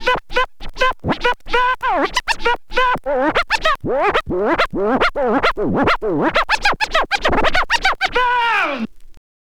scratch med, Vinyl Attack scratches mix

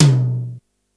tom, Yamaha RY-8

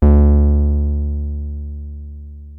303 C3, TB 303; Hollywood Edge; Giorgio Moroder Rare Synthesizer Collection

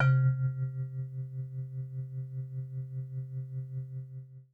C3, Synth Line; 90 s Sample CDs; NiceBeats; SynthLine VOL-32

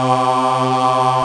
GOSP. AAAHH; Sound & Vision - Gigapack II CD 1

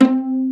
PIZZ VIOLA; E-MU Formula 4000 Series Vol. 5; Protozoa; Proteus2 Instrmt

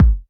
BD, Bassdrums, Roland TD-20

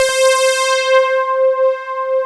poly synth C5; Big Fish Audio; Synth City

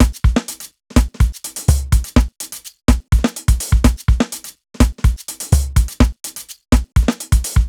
125 basic, Nu Jazz House, Beats

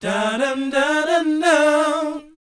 BOYZ, Vocal Planet, R&B & Dance; ILIO

